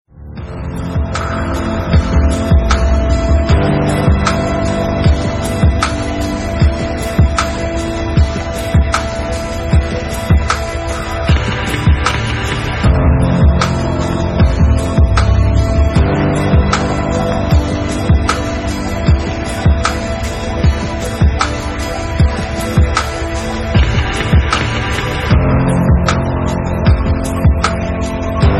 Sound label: tear drop